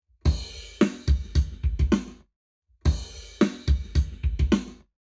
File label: Drums, 0to360deg; Lab Wet